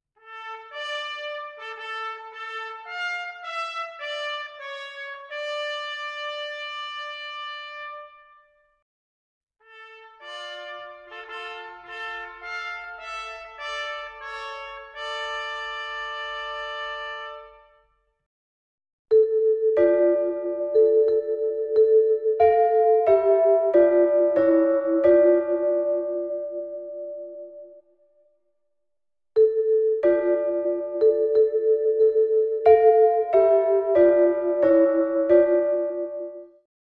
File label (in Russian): Труба колокола